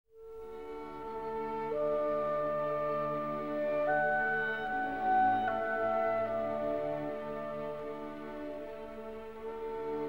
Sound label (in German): Klarinetten Konzert A Dur 2 Satz